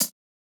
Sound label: hh push, Hats; drumkits; fl studio; goodtakimu drumkit